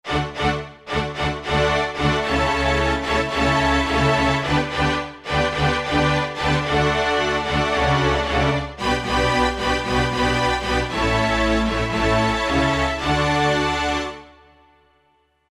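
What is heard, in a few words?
ensemble orchestra; E-mu Proteus FX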